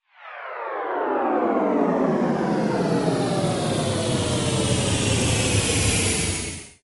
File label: Space Ship Landing Alert; Uss Enterprise